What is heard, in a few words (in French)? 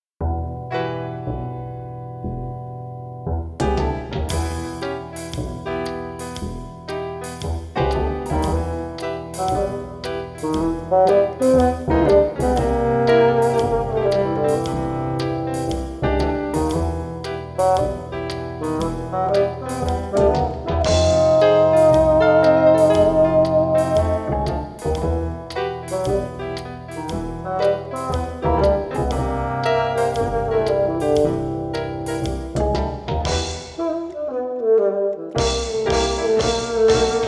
basson